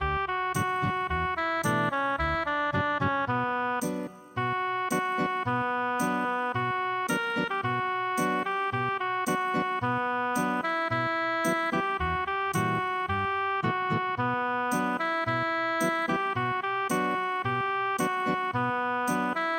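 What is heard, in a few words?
foreign guitar; Ali Pasa song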